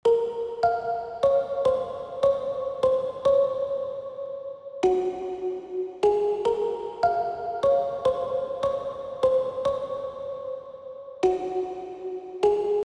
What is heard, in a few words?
Pluck Musicbox